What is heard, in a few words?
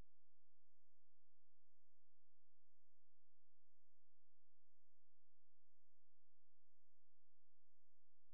C#, Synclav FM; Big Fish Audio; SPACE1